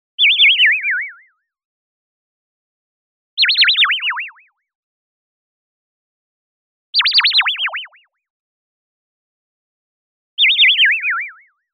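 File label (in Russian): птички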